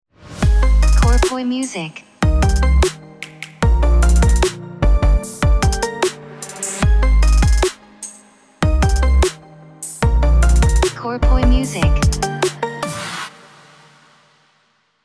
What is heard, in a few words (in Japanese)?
jingle ウォーター